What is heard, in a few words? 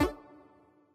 collect; bonus; aviamaster; Aviamasters